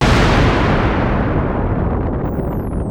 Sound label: gate